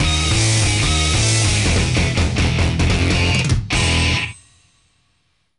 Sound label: Lose; Kamen Rider Climax Heroes OOO; psp